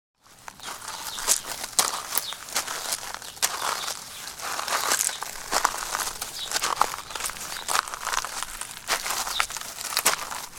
Walking On Gravel, Footsteps walking on wooden gravel path; Footsteps (Sound Effects:Human)